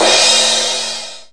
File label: Linn crash; DRUM MACHINES